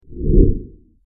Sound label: whoosh smooth